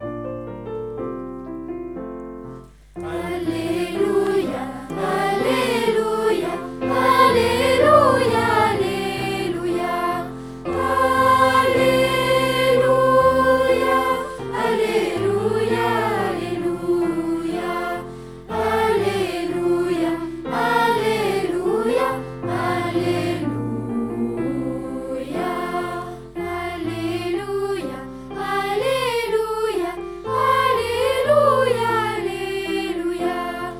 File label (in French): Halleluja, petits chanteurs